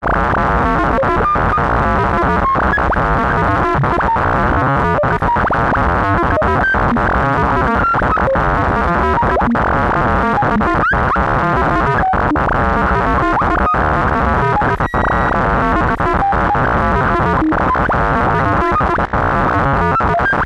science fiction computer malfunction crazy beeps loop; Skyclad Sound